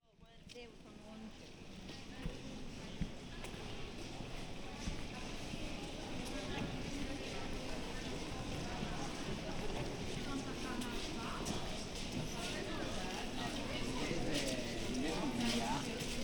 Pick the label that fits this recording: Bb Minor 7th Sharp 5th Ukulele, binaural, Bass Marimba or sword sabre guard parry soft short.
binaural